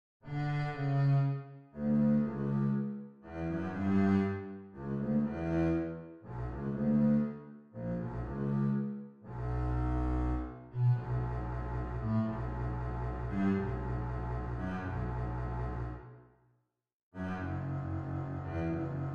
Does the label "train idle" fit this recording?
No